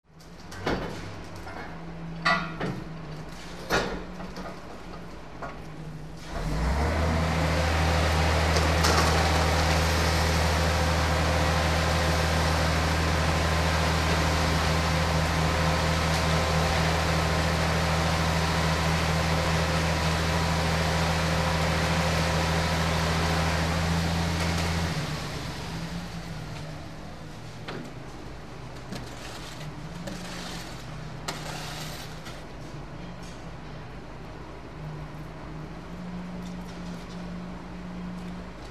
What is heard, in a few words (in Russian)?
betonomeshalka peredvizhnaya